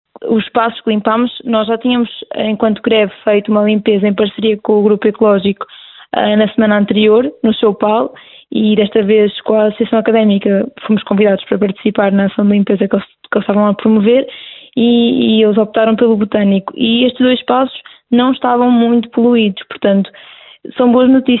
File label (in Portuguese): Ambiente